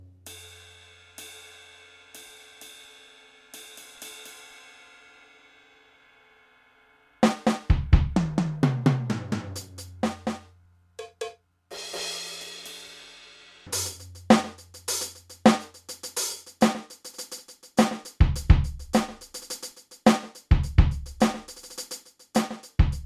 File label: Millenium NonaPad Rock Kit